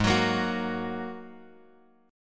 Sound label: G sharp 7th Suspended 2nd G sharp; G#7sus2 Chord {4 X 4 3 X 2} strummed